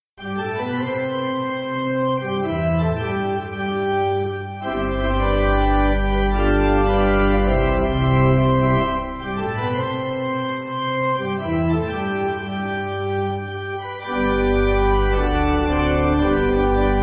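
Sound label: Pipe Organ, Music Backing; James McGranahan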